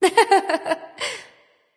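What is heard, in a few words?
"heh heh", nonverbal; smite; voicepack; khepri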